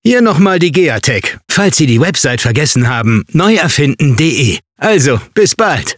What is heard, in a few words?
Reminder